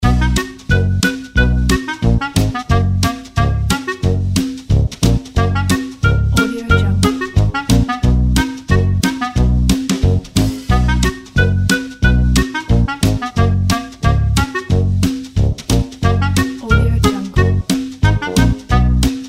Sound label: comical easy clarinet